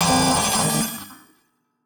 Battered Synth; malfunctioning synth samples

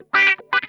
THROAT WAH, Funk Guitar; Zero G - Funk Guitar

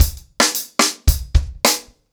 110BPM, ACOUSTIC DRUMS

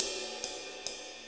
6-8 Ride, HiHat & Ride Loops

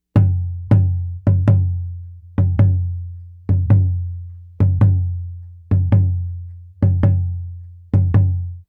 Tambora, African

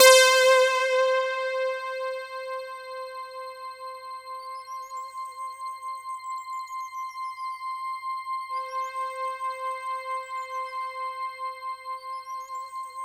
C4, SynthLine; 90 s Sample CDs; NiceBeats; SynthLine VOL-11

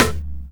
Percussions

